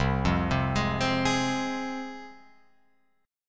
C Suspended 4th Sharp 5th C Guitar Open F, Csus4#5 chord; Csus4#5 arpeggiated; 0,0,0,3,3,3; JGuitar